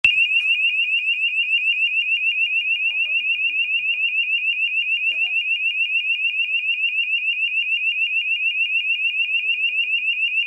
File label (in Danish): overfaldsalarm